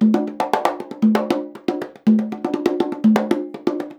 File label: 120 CONGAS, Percussion Loops; USB Soundscan vol.36; AKAI